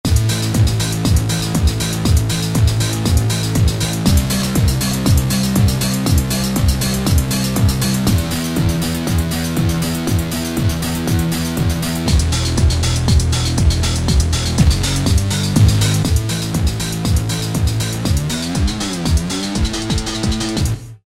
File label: Grity Groove, Roland V-Synth; Factory Patches